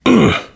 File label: shoved